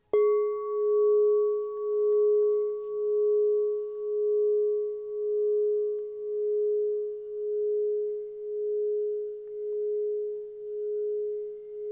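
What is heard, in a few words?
6.5 inch G sharp, crystal bowl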